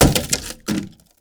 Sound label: wood break